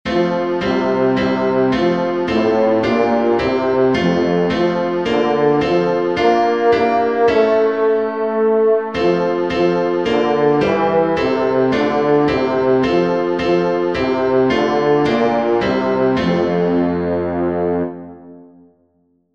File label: st flavian bass